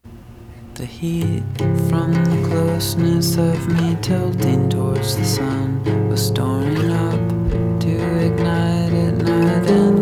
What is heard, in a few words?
acoustic